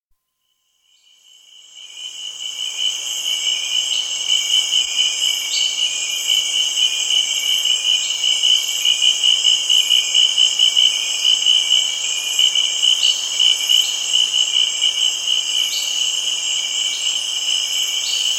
Cameroon: Kribi, early evening tropical rain forest atmosphere, with insects & frogs; Forests And Jungle Sound Effects; Africa; BBC